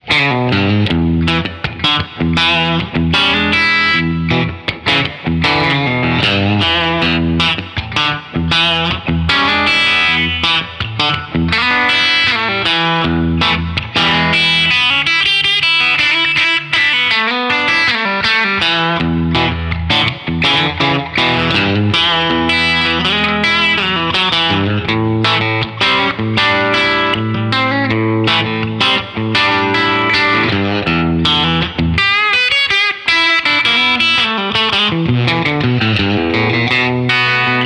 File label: Tele MTN Clean Boost